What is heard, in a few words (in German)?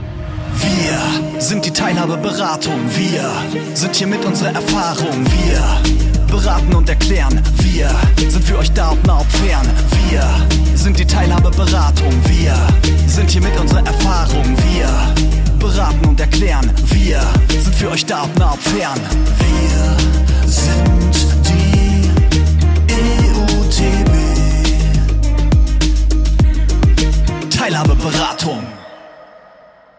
Rap Klingelton; Beratungs-Arbeit trifft Rap: Mit Musik und Worten zu mehr Aufmerksamkeit; Fachstelle Teilhabe-Beratung